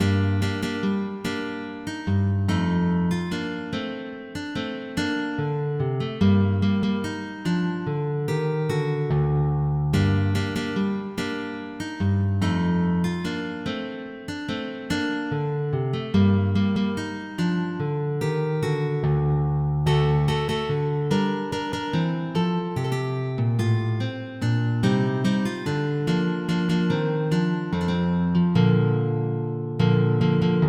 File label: Sarabande, MIDI